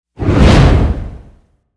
Whomp Wipe; Ninja Tracks

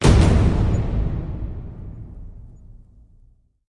Hit Big Double Impact; Brand X Music; Brand X Music Catalogue - Editor's Essentials

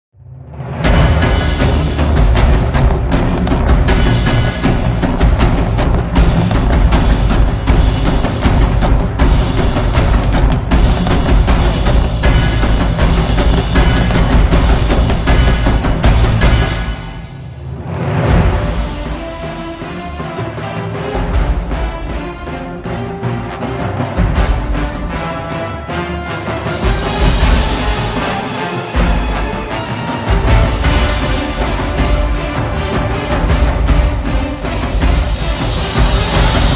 Inferno (Without Choir)

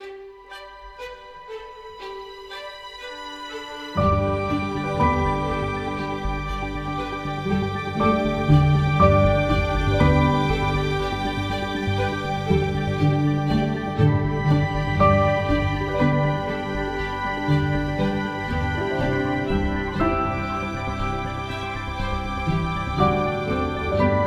Light Ambient

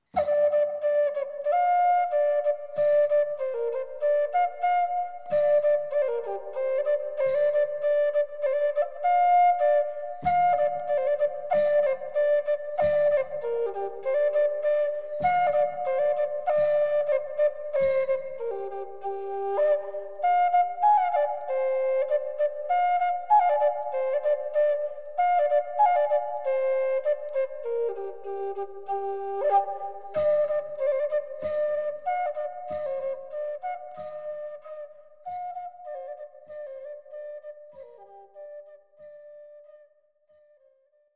Waking, Relaxing Native American Flute Music

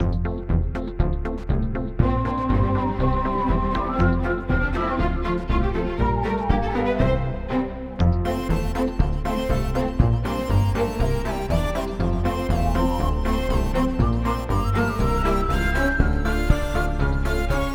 Cheery Ambient

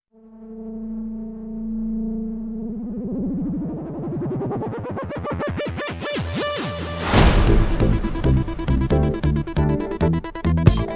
Electro Beat

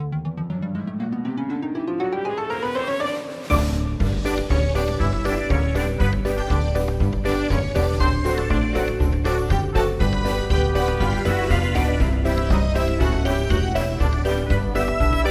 Circus Ambient

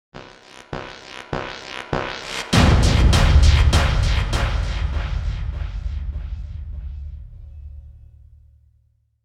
Slam; Gothic Storm Music; Brain Warp (Sound Design Trailer Tools) 2011

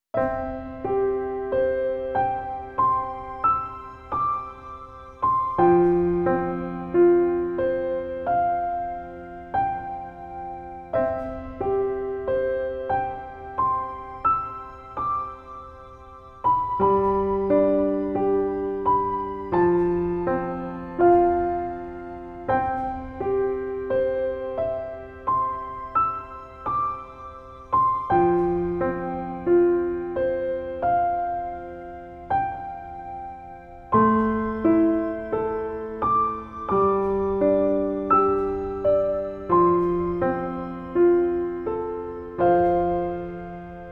Piano Lullaby

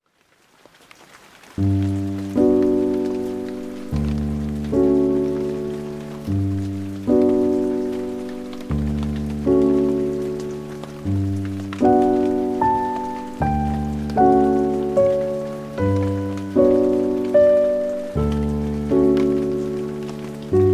With Rain, Piano in Nature

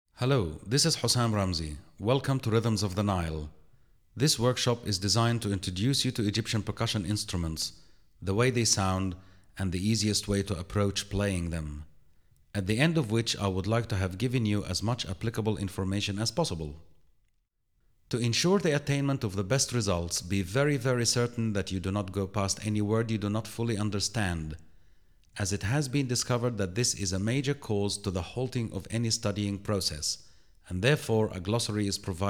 Percussion Introduction, Egyptian Dance Rhythms

